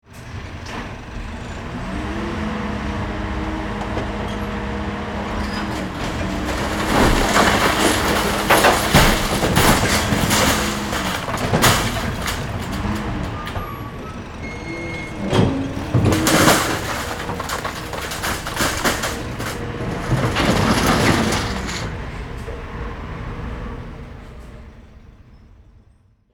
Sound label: Garbage truck loading trash garbage collection; construction vehicles